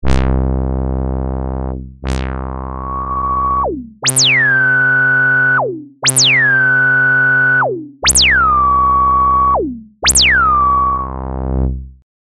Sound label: Repro Trumpet Reso